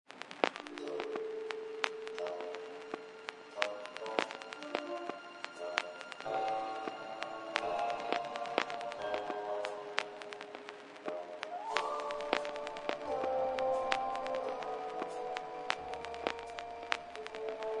spy main theme, music

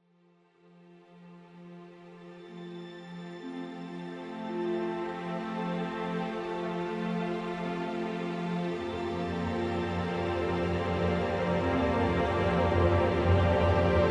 forest realm, music

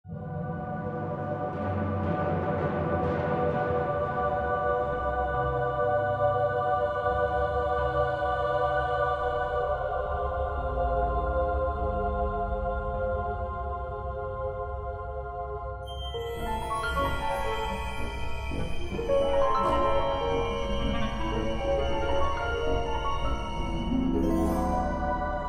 alien artifact, music

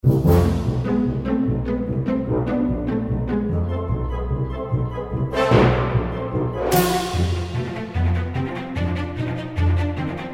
carnival ride, music

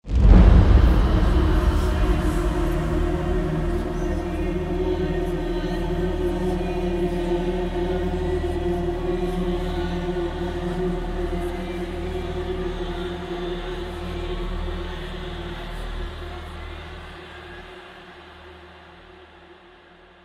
ancient power awakens, music

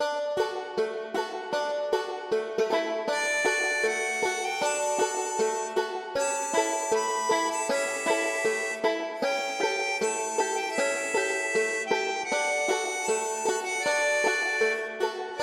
country farm, music

